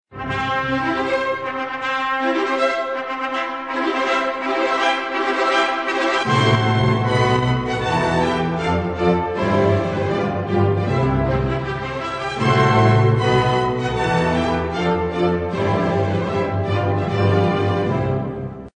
musica de casamento; efeito sonoro

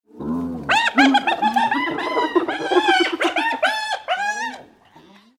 som de hiena rindo efeito sonoro

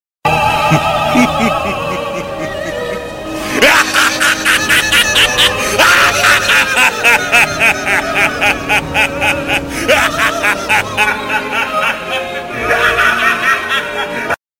sinfonia da risada

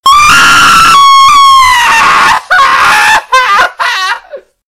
mensagem de grito

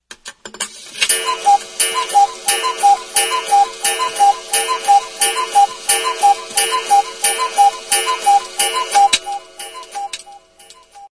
toque do cuco despertador

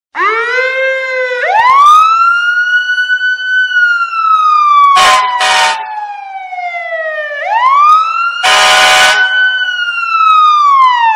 sirene policial vs buzina de trem; som de sirene policial e buzina de trem

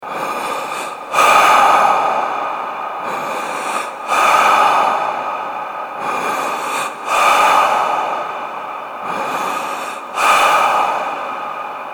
respiracao com eco efeito sonoro

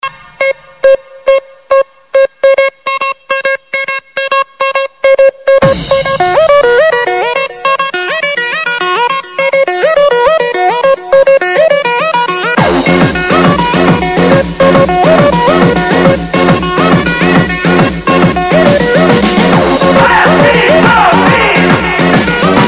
dance music alerta

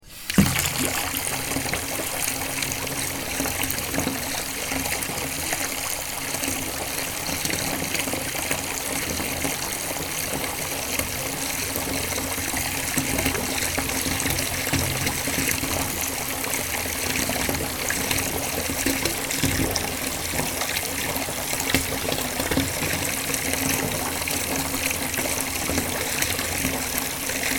muita agua caindo; efeito sonoro

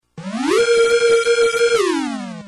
sirene alerta ataque

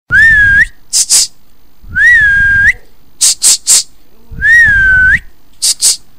celular assoviando

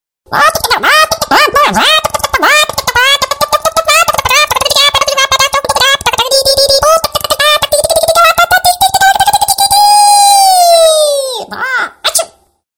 Galinha Louca

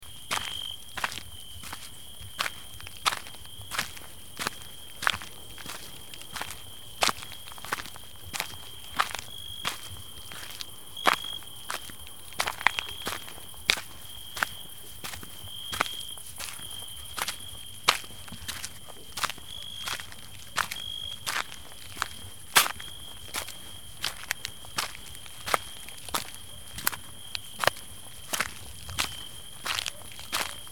caminhando a noite efeito sonoro

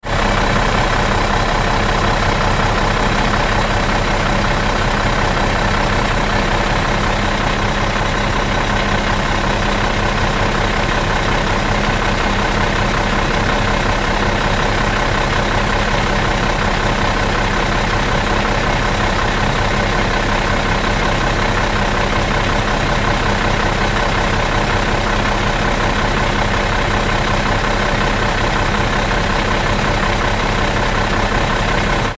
onibus parado efeito sonoro

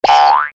boing, cartoon desenho animado

